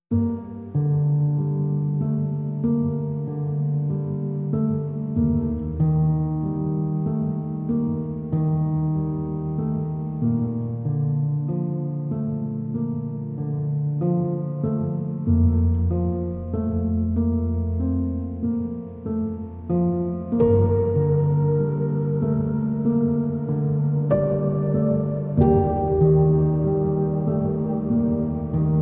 Piano

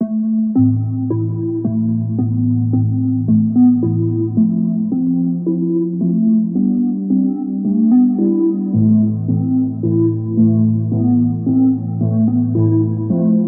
Chaotic, Underscore

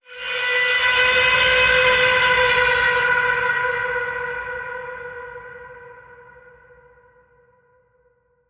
Bend, B

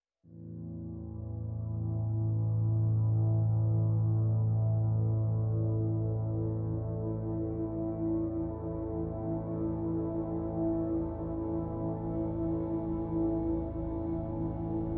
Pads Absolution (A)

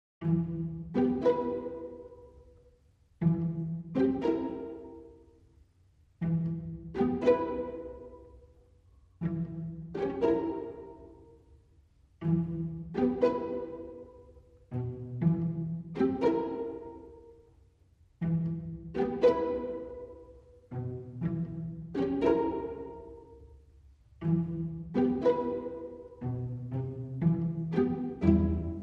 Strings Only

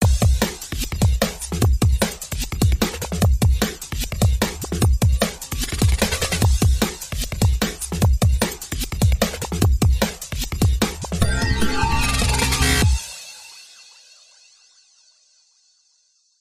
Ethereal Drums & FX

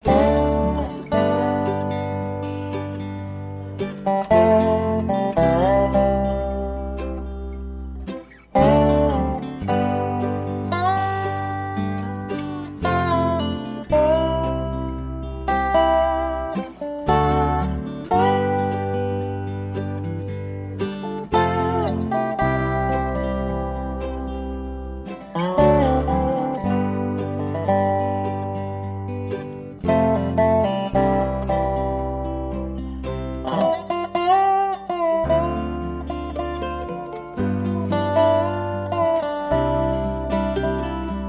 Folk Ballad; Folk Ballad 1

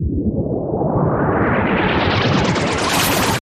Fluttering Noise Transition

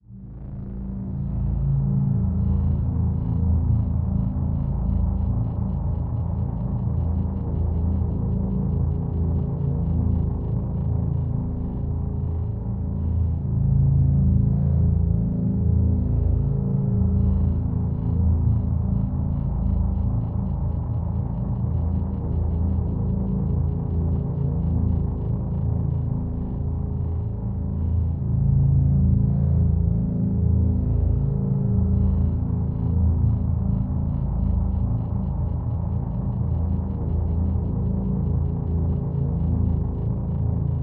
Drone Me Deep

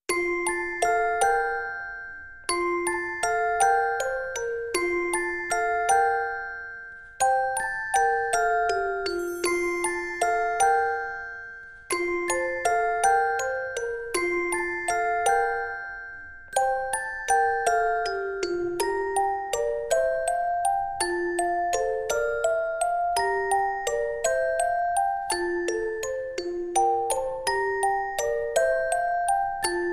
Music Box Only

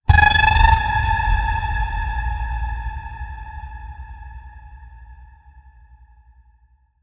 Afterlife Ghostly Bend

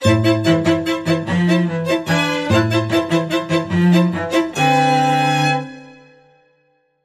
Spiccato, Sting

